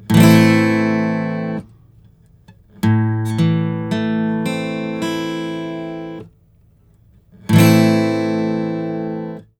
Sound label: Major or Minor